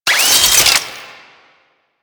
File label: Necramech Repair Drone Idle Long; Enemies; Warframe